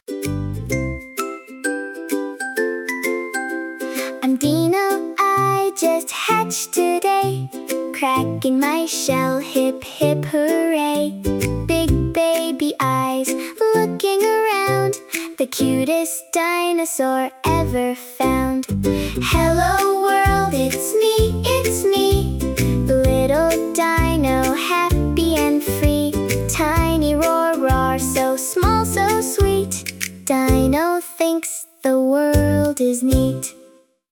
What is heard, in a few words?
hip hip hooray